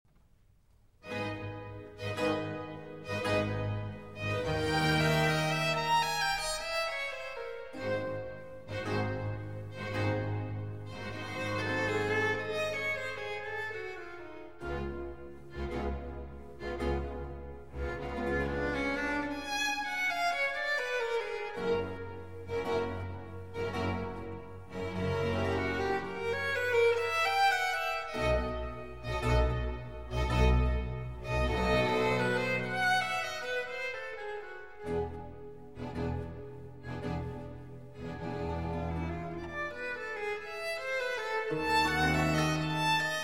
Violin Concertos, Concerto in F Major

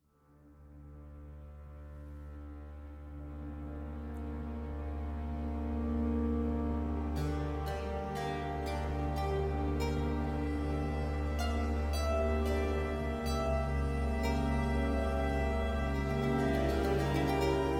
Violin Concerto in F Major, Adagio Molto; RV 293, “L'autumno”

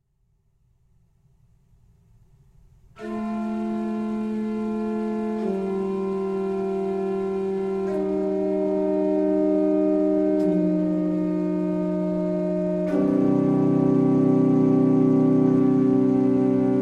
For Organ; All Life Long

